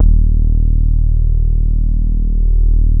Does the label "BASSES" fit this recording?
Yes